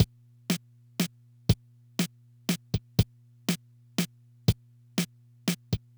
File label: Loop, dd-5